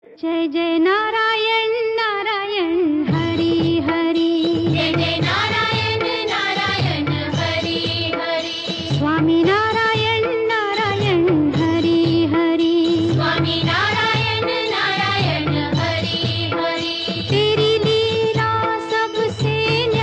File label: Female; Krishna Ji